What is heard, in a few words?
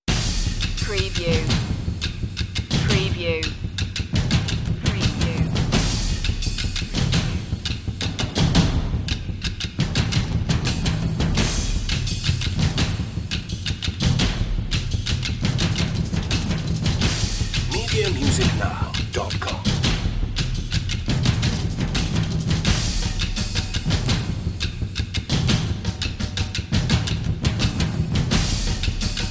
spooky things percussion only version